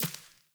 Sparrow Step, Footsteps; Animals

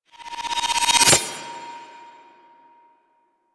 Nursery SFX Sword Recall; The War Within; Teshin

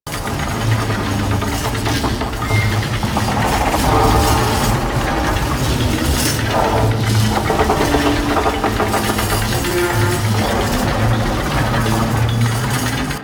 Asteroid Drill Machine Pull Loop; Warframe; Ambience; Grineer Asteroid

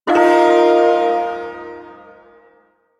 Shawzin Blues Chord; Warframe; Duviri Ersatz Shawzin

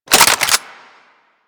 Double Barrel Shotgun Reload Open; Warframe; Tenno

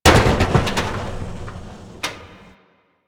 destructible metal barrel

